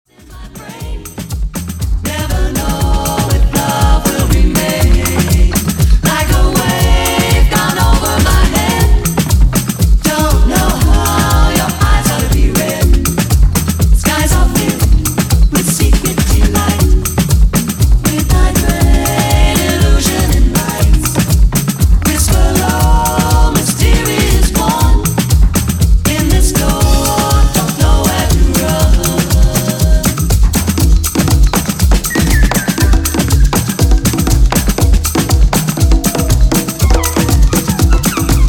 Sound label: Afro Beat edit